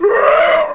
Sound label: Zombie Dead